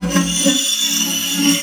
SPINET; Xsample VOL-7

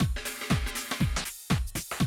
Classic Drum Loops

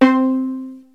STEEL DRUM, PEAVEY DPM; OTHER SYNTHS; Rubber Chicken Software Sample Bank

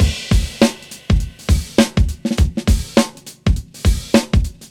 drum loop recorded

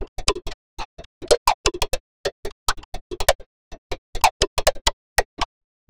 atonal perc loo

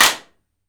CLAP, HAND CLAP; AKAI S6000 CD-ROM - Volume 5

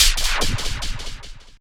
hit, IMPACTS & HITS; Techno Trance Essentials

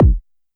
BD Ana Hard; YAMAHA RM1X

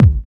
BD, BD Dance; Sound & Vision; Gigapack I CD 1

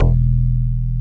PULL BAS; Synclavier Sampler Library; KEYS AND GUITARS

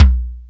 drum d4, DRUMCOMPUTER YAMAHA R5+RX7; Best Service ProSamples vol.55; Retro Sampler

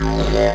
ethno, ethnic; Techno Trance Essentials

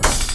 QUAR FX; USB Soundscan vol.24; AKAI; Industrial Loops

